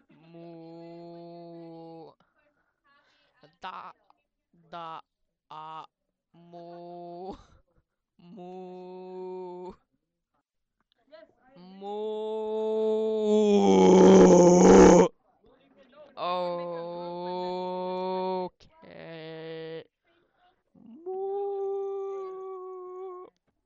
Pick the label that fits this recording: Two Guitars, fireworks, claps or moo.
moo